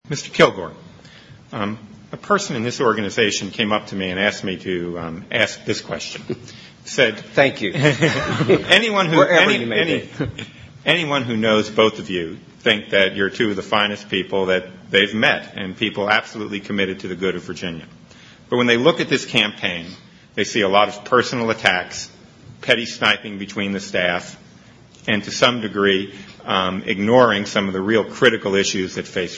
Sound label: tone